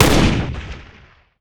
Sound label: Sniper Rifle fire; weapons